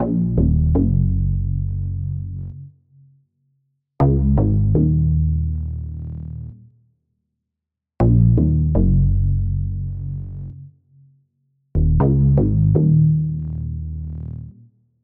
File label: Donk